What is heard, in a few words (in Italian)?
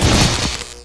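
Elettrico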